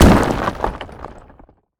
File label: wall rocks; Golden Crayon Sounds